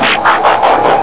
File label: transform down